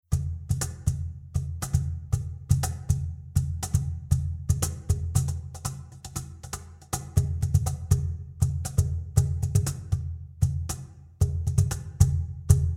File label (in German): Cajon Groove Pur Cajon